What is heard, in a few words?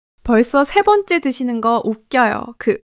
laughter